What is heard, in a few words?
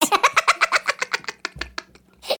laughing sped up